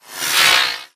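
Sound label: Drone Movement Turn; Titanfall 2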